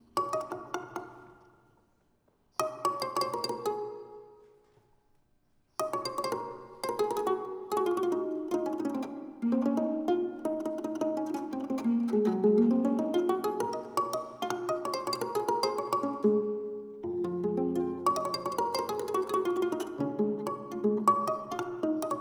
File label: Improv Interlude; Zal Sissokho; sound of montreal